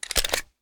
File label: reload empty fast mag out; reloads; gmod